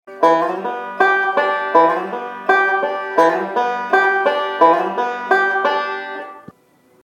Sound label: lick (2-5 slide) variation